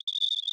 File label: Env Void Cicada 3 Singles